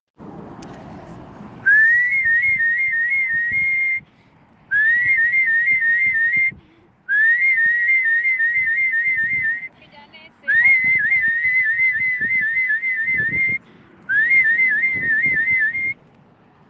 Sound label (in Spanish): silbido